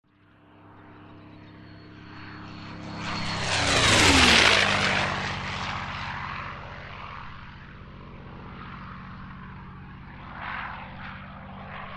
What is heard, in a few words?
P51 Mustang